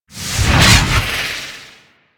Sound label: storm dash; Warframe; Warframes; Ninja